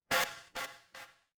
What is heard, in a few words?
feedback error